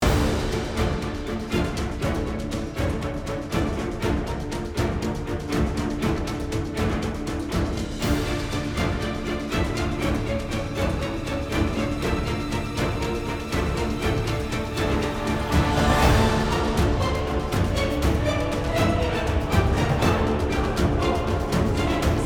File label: battle dungeon